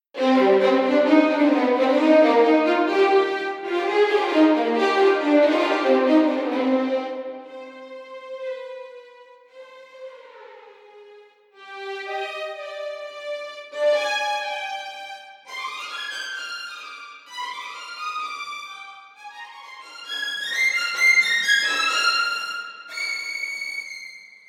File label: First Violins Test Reverb; LSS